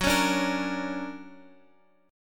D Augmented Major 7th Gb, guitar

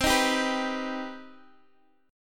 C Minor Major 7th, Guitar, DADGAD; down; chord shape

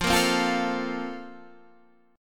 A sharp Major 7th Suspended 2nd, chord

